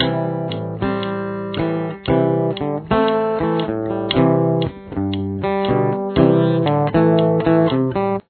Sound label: main, verse riff